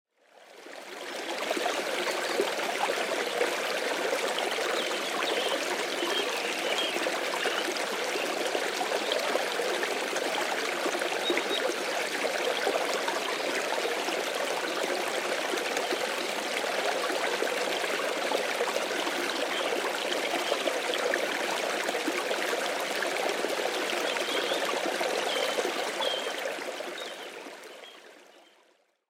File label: Mountain Stream and Birds Sing